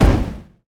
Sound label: fireball impact